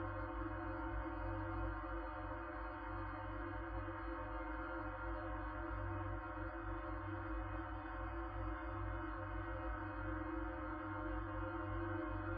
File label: Drone rich low metallic with higher faint elements